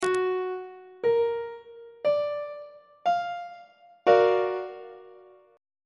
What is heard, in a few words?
g flat augmented major 7th chord on treble clef